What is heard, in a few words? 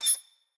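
sign squeak; the wolfs night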